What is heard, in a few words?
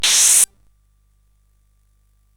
Shuffle, CSG8580R5; hvsc